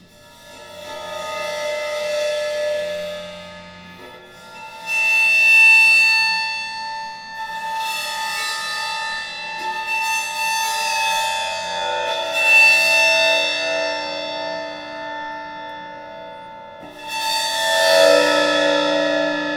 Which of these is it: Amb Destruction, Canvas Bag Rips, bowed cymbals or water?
bowed cymbals